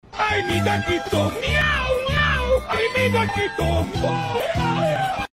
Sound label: Meow Meow